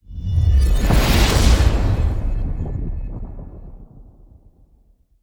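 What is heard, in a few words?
light magic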